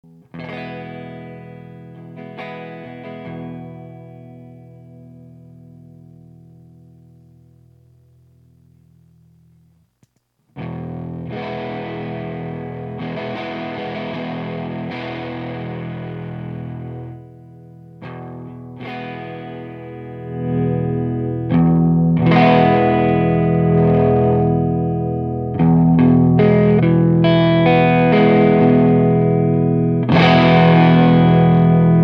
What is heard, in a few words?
Clean Boost; ScrewDriver